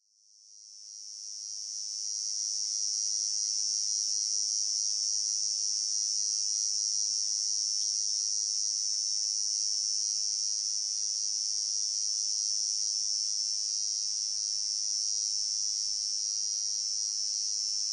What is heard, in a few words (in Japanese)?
koezozemi